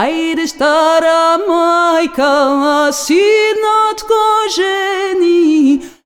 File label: VOICES; USB Soundscan vol.63; AKAI